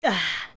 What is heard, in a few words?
Ahh; Link refreshed after a drink (or breathing after a dive); Adult Link